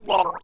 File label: Drowning, Humans; zps; HM Water